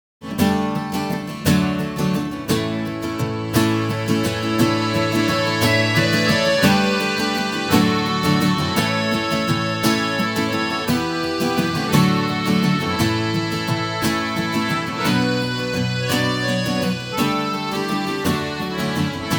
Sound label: Acoustic Guitar